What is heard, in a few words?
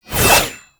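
Stab Cloak Cast; Enemies; Abilities; Warframe; Tau; Dax Ninja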